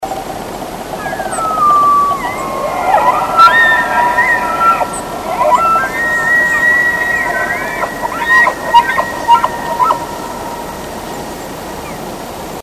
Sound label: bull bugles peak, elk